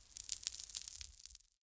Cave Roof Dirt; kult

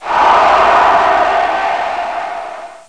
Bat (Death); Death Blade; Creatures

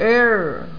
err

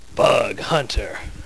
intro sound; Wraith of Orcon

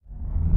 tremor; kult

